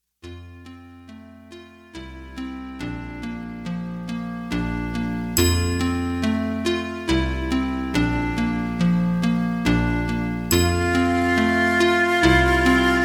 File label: Fluted Glass